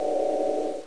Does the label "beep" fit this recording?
Yes